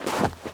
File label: snow step; Golden Crayon Sounds